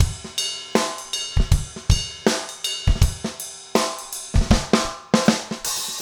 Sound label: trip hop